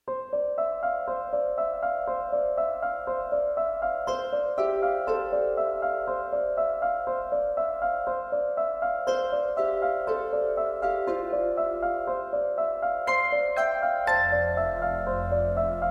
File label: Lobby Retro; The Sims 4; Universal Lobby